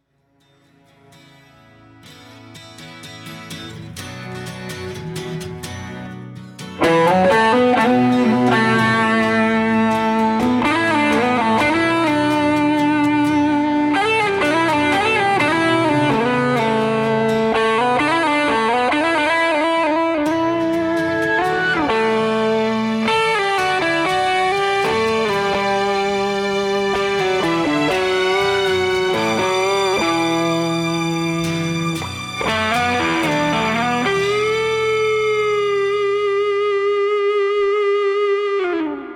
guitars and strings

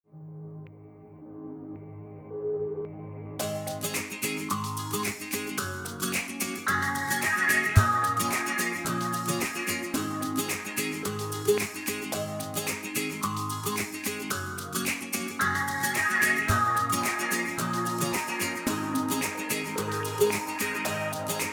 electro flamenco remix

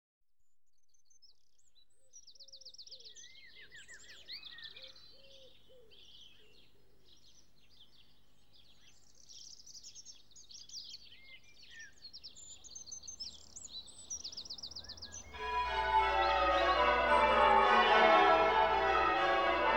dawn chorus and college bells stedman caters; magdalen college oxford

